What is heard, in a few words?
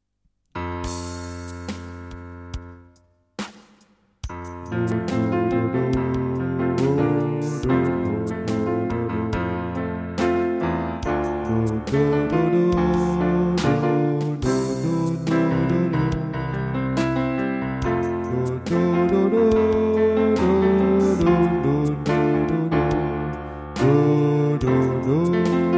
ballad alto; ballade nord irlandaise ( alto); chants